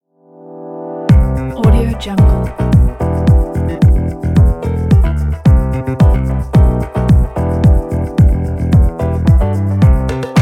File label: light fashion lounge